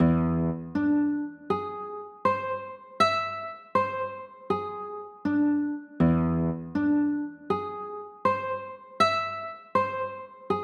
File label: e 7 sharp 9, chords